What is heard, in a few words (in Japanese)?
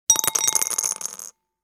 チンチロ サイコロを振る 小丼; ボードゲームやカジノ、麻雀の効果音です。